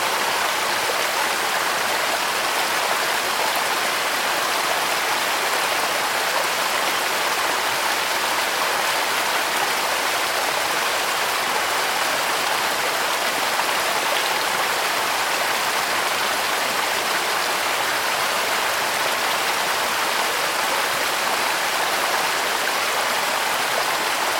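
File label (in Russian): горный водопад ледяной воды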